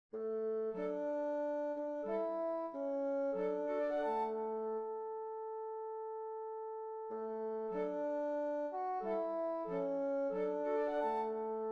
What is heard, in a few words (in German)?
Floete